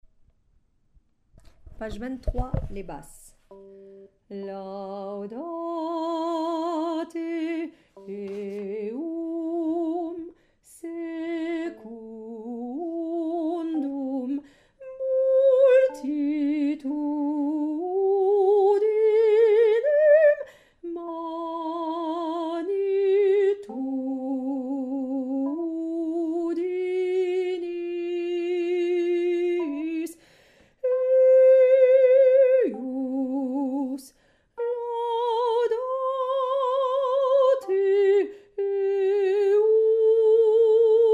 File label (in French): psaume Basse